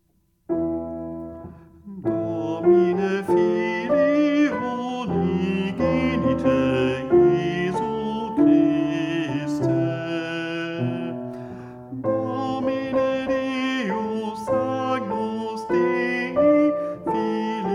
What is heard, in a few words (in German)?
Tenor